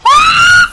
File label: screeeeee